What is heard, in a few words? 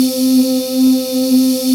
VOI VOICE; Sound & Vision - Gigapack I CD 2